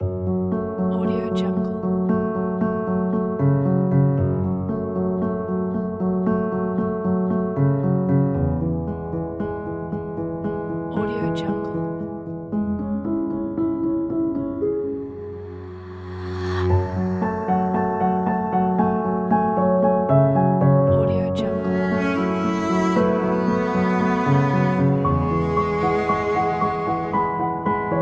cinematic sentimental

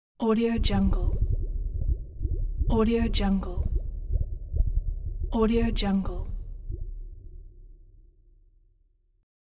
underwater bubbles

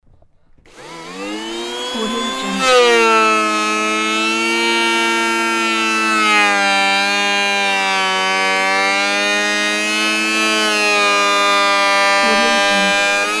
electric planer

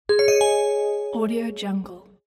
win

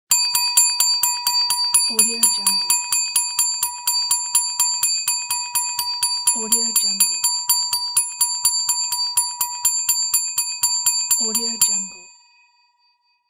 banging on hotel desk bell

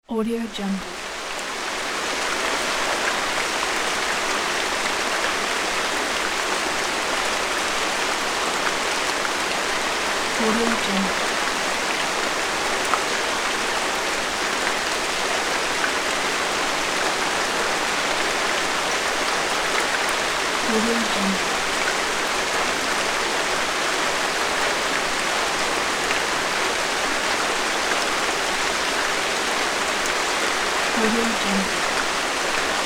heavy rain shower in neighborhood

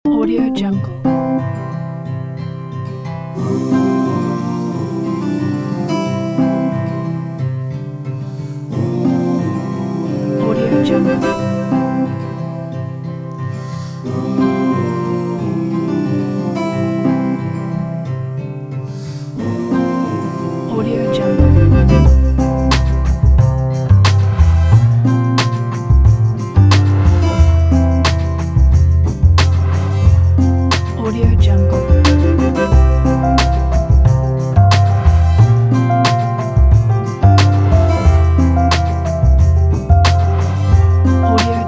presentation

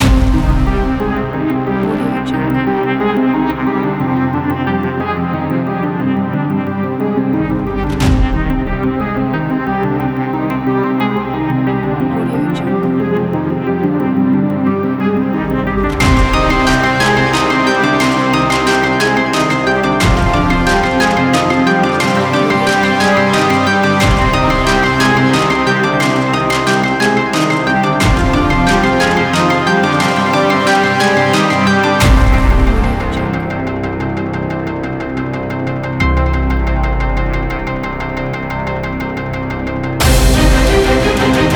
the oncoming storm

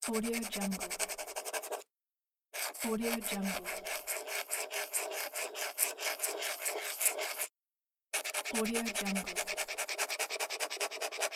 pen write

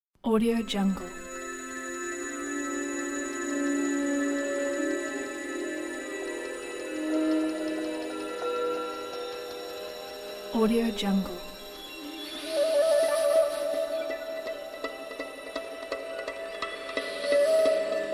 entering the jungle

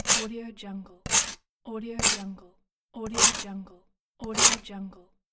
ice scraping fast; lokohighman

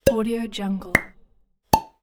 pops

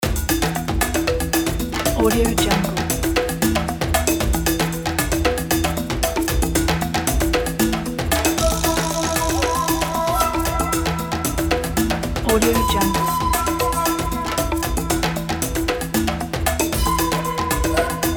jungle escape; cinemedia

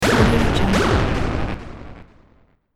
glitch power loss

